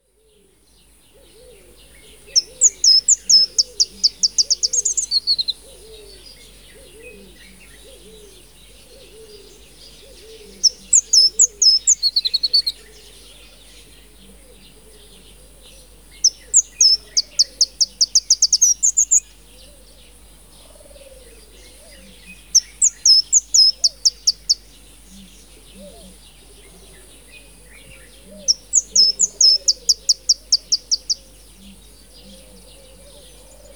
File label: Marico Sunbird (Nectarinia mariquensis)